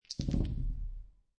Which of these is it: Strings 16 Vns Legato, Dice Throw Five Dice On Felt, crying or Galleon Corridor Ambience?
Dice Throw Five Dice On Felt